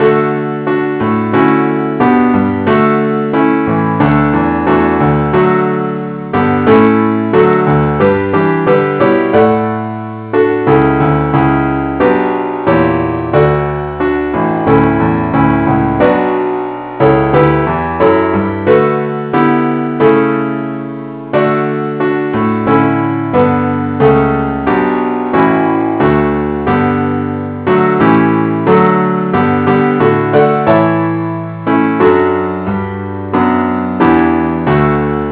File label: keyboard only key d; christe Sanctorum